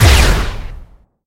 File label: rocket attack